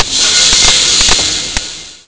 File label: weld